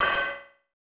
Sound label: Gong; Shiroi Ring he Twinkle Little Star; SNES